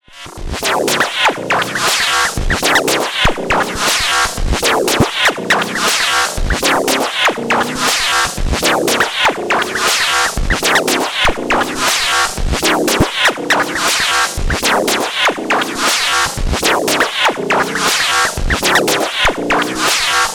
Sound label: Wild Noise Beat; Erica Synths Perkons HD-01